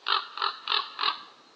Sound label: Small ambient crow; STALZONE